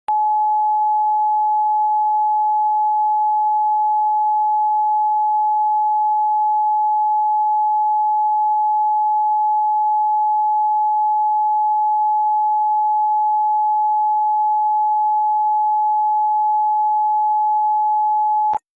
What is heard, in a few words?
Beep